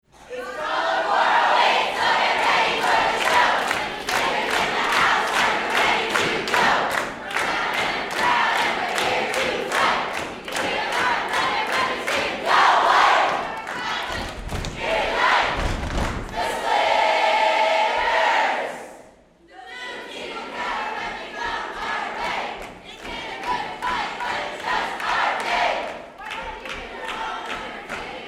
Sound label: March